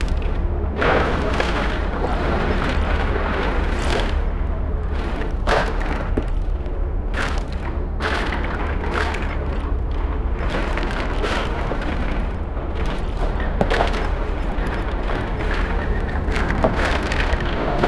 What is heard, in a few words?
ottoman soldiers pulling